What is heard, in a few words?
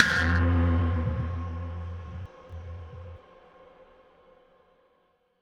LEVEL FAIL; Gorilla Escape City Attack; Android